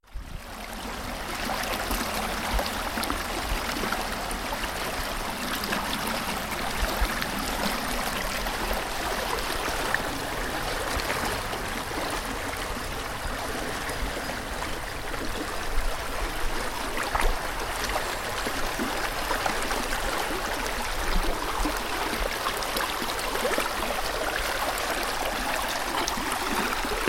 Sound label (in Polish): potok gorski